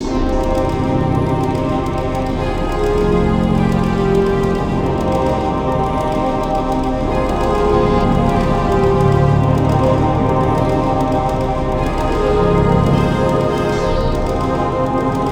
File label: suspense